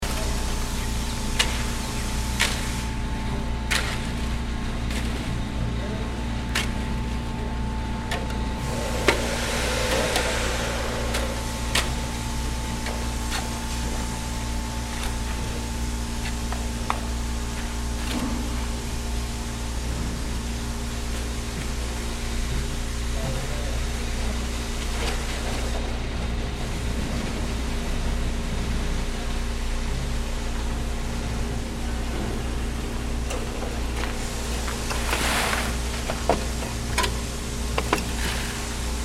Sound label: Construction site heavy machinery ambience